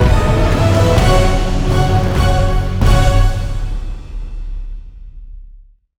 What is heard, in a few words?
large win; netent